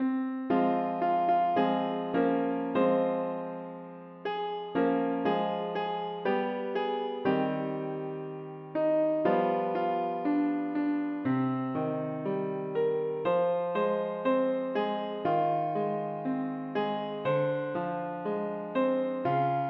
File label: piano